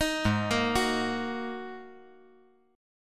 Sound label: G sharp 7th Suspended 2nd G sharp; G#7sus2; arpeggiated; 8,8,6,9; JGuitar